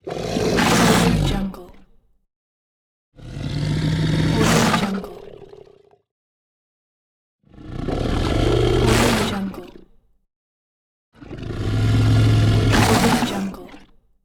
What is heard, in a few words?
beast growl